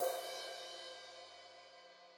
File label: ambient crash cymbal drum sound c key